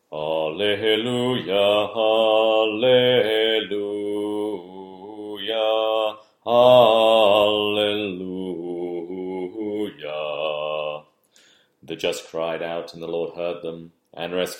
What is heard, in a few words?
Alleluia Tone 4; Two or more martyrs